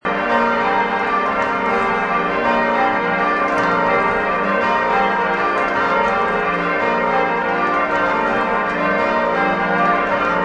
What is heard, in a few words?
dunblane cathedral belfry tolling of bells